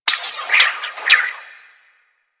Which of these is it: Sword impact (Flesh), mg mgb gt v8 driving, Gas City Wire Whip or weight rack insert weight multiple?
Gas City Wire Whip